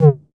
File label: 606 Dist. Tom